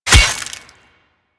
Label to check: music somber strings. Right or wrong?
Wrong